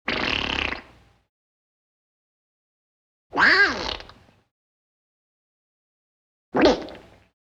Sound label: Chimpanzee Vocal Raspberry